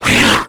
imp pain, monsters; DoomRTX; imp5

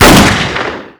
Pistol shot short; Foley; Doom

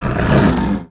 imp attack melee, monsters; DoomRTX; imp5

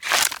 Imp Tear Flesh, Claws flesh; Foley

